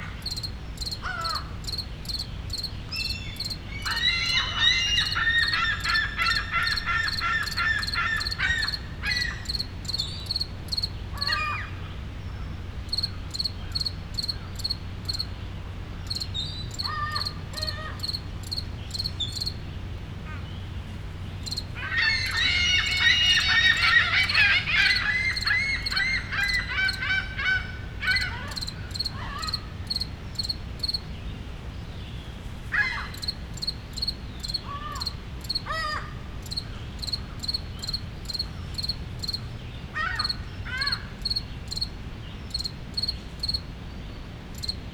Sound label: AMB PARK Solo Cricket, Seagulls, City Rumble, Montreal, Canada, LOOP; Articulated Sounds; Nature in the City